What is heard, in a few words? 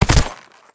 Raptor Mount Death Underlay